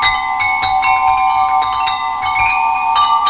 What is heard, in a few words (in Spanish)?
campanas, windchimes